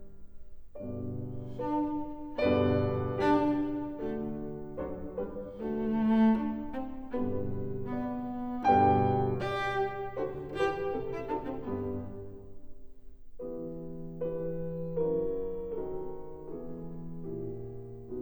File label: Variations for cello & piano in G major